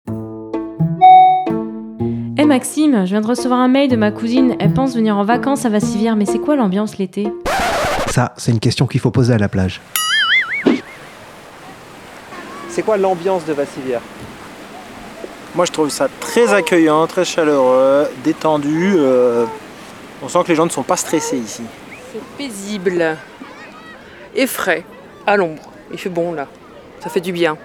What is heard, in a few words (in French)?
MICRO TROTTOIR AMBIANCE